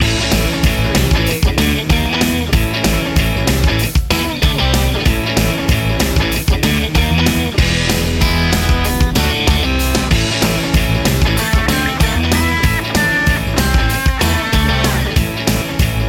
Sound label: energetic indie rock loop